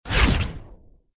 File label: wipe fast digital